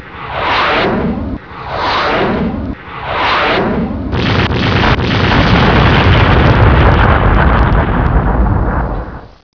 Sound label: warfare; Virtual dj sound effects and plugins